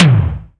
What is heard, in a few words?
new DX tom